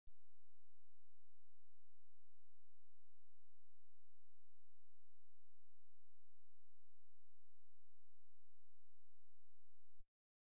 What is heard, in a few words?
5kHz square wave @ -20dB FS; dbx test